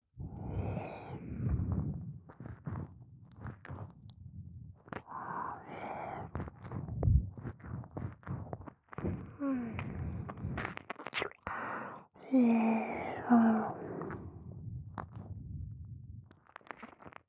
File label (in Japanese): 耳奥舐め 左